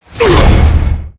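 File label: Knock Attack Swing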